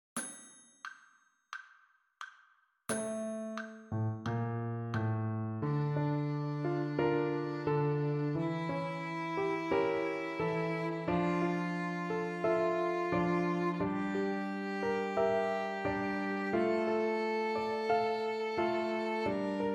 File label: d harmonic minor scale